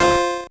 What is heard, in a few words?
core squish C3 F2